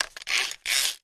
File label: Electric Drill; drills